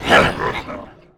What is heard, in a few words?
Bruise